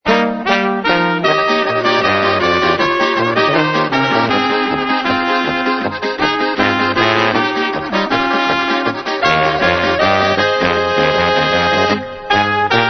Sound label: Polka